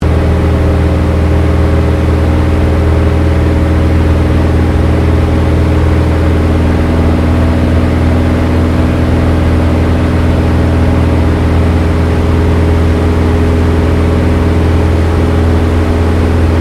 AIRCRAFT CESSNA O-1 BIRDDOG FLYING INTERIOR, spotter aircraft; Airplanes